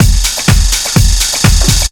drumloops 125; Vocal House; PS-28 Vocal House Samples; clonk4clonk

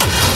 Bassdrums Hard Kicks; Vengeance Samples

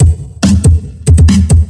Breakbeat; Vengeance Samples; VEC2 Loops

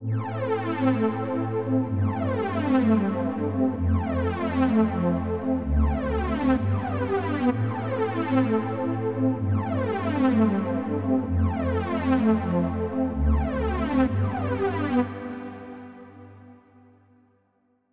128BPM Pad Root A; Notice; Vengeance Samples; Notice Kit

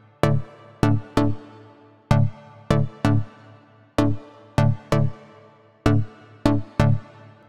128BPM Chord Root D; Vengeance Samples; Entourage Kit

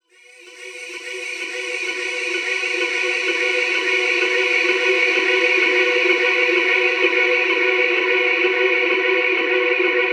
Reverb Athmospheres, FX Vocals; Vengeance Samples

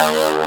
Synths C; Vengeance Samples

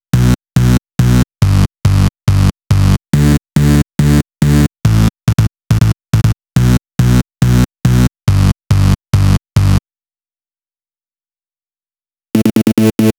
Male Bass, 140 BPM; Vengeance Samples; Vocal-Hook Kits; Song Kit

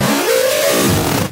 Synths G#; vengeance essential club sounds-3

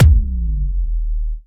FX Boom

